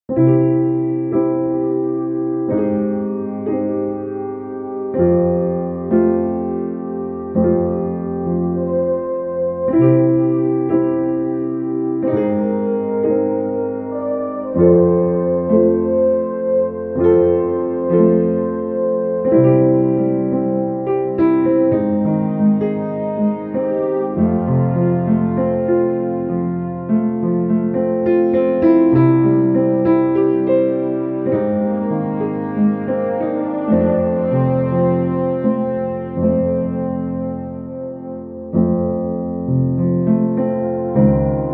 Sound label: Wild Ocean Piano